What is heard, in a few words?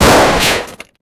realistic sound of a guns, gunshots